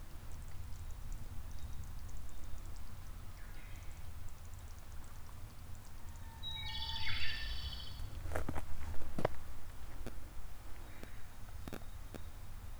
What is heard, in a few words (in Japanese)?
komadori; takane jyatouge